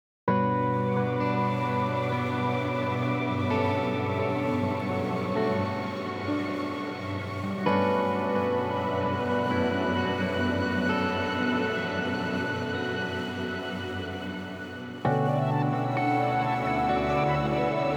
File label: KONTAKT THIRD PARTY SONG FULL